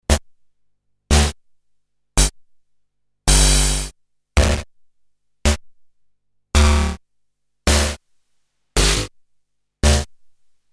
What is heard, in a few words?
Korg DDD 5 Deep Mod